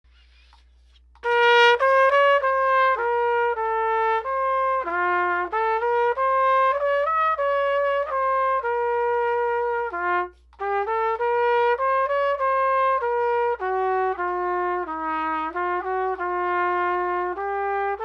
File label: Trumpet C Minor Etude